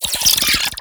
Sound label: Brood Spider Vocal; Warframe; Oraxia